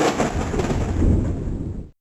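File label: Slick Road; 300 Drum Machines; Yamaha DTXpress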